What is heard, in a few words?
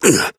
pain small; Operative